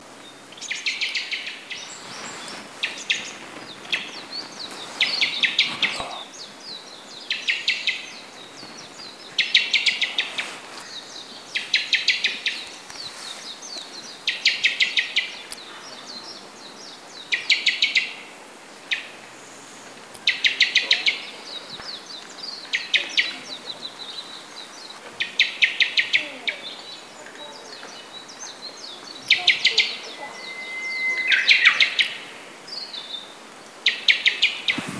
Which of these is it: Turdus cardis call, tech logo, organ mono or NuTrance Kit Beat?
Turdus cardis call